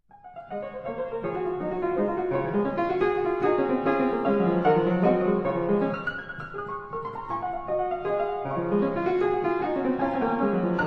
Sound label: Etudes for piano, D flat major